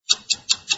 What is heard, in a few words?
timeout